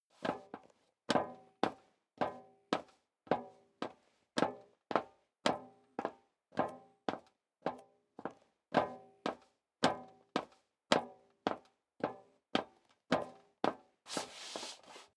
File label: step leather shoes metal dry upstairs sequence; schritte